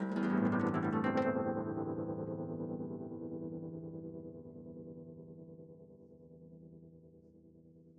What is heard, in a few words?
Kantele